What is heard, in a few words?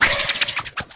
State Slide